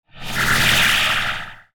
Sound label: Sci-fi Transition